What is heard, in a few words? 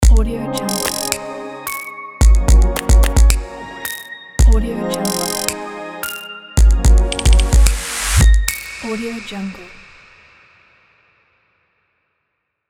ambiant glitch logo